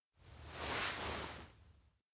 nod small; anemoi

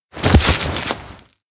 grab arm; fumiya; anemoi パッケージ版 1.1

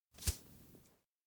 grip return; anemoi

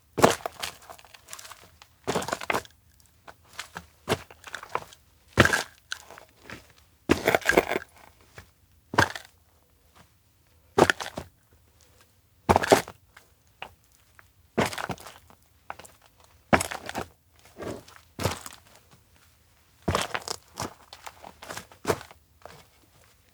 steps outdoor hut enter rubble slow; ken; anemoi

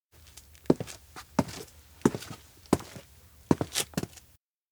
steps outdoor town walkin; ken; anemoi

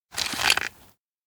check controls; anemoi

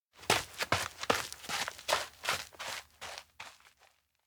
steps competition entry; anemoi

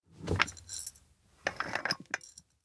jar lid open; anemoi パッケージ版 1.1

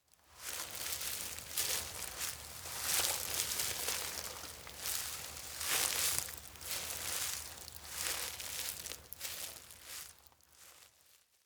steps outdoor grassland walk follow sound; anemoi

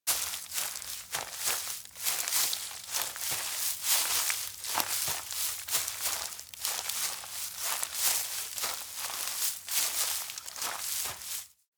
steps outdoor field walk; minato; anemoi

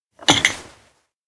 coffee cup place bad; anemoi

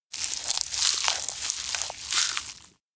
eat carrot; baron

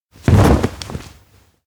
new hall jump; chiharu; anemoi

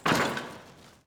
Bicycle collision; anemoi

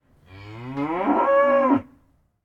cow voice; anemoi

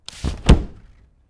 umbrella open; anemoi

